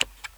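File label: computer key; 1000fx; OFFICE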